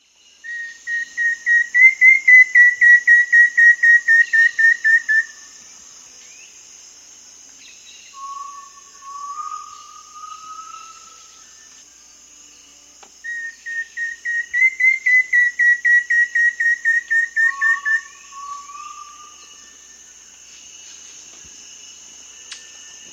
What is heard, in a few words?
Rufous-Fronted Antthrush, S. America